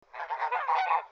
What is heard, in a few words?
goose